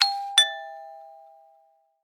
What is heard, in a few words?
doorbell